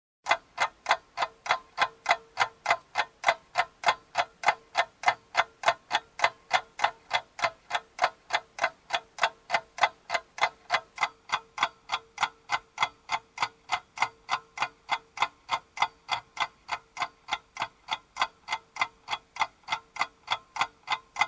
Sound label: Mechanical clock ticking